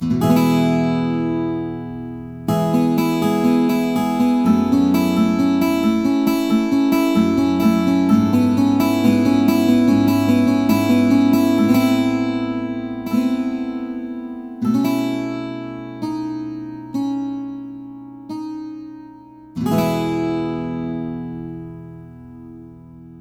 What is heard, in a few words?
12string